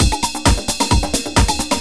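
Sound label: 4 floor, drums; 4 TO FLOOR + PERC; Loopasonic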